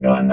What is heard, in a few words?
gun; FortressForever; vox; robot